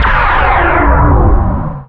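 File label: Laser Fire Interior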